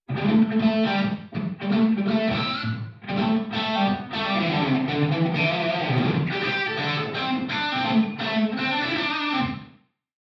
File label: e guitar wet